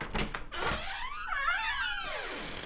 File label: Door Opening